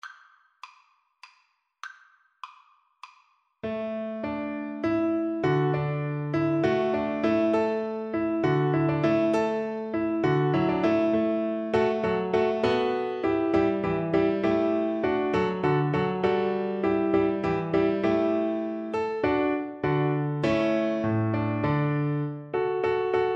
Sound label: water music, Viola